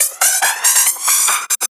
Fills, Loops 140 BPM

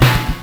HASH HIT; SP1200; E-MU Producer Series Vol. 8 – Platinum Phatt; PlatinumPhattCD1

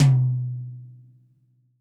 TD-20 Tom B, Roland TD-20 Toms

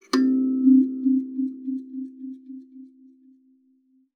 KALIMBA C4; Xsample VOL-7

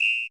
WHIST1 O, PERCUSSION; Crypton - DrumBase5000; PERCUSSION#1

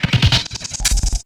SFX, Drum & Bass; Ueberschall; Drum & Bass Resonance

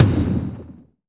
T-TOM, PERC; NorthStar - The Wizard VOL-2; TSAR PERC

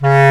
WND CB Clarinet; Roland LCDP04 Orchestral Winds; Lo Clarinets

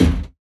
Big Tin, household rhythm samples Hits

